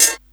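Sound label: hi hat; ALAKIT; USB Soundscan vol.31; Miro s Grooves; AKAI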